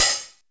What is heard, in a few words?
TEK 909 OH, TECHNO; Drums In Your FACE!